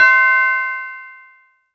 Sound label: Casio CTK-611 Tubular Bell C5